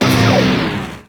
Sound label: Proj Large Fire; Warframe; Lizzie